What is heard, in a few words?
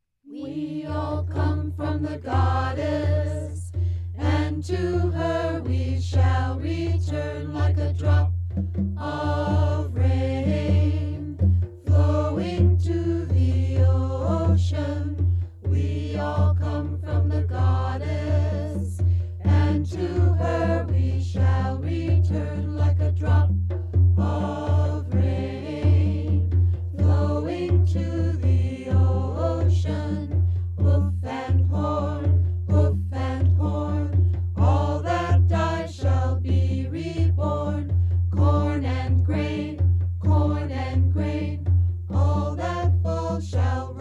Chants